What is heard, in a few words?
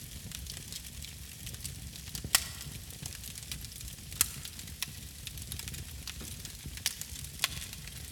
object fireplace